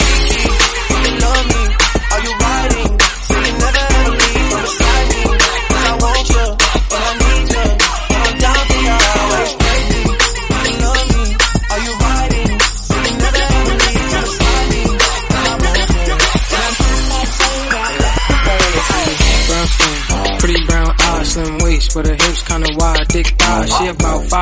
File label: 100 bpm Cm